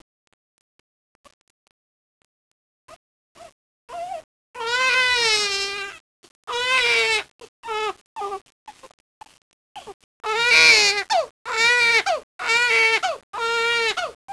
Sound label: wahh